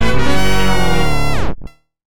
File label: fanfare short bug